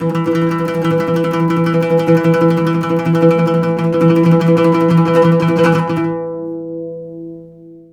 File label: LUTH 3 E3, Arabian Traditions; USB Soundscan vol.43; AKAI